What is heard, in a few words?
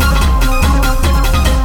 drumloop; awe32